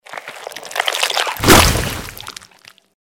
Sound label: attack; hero sound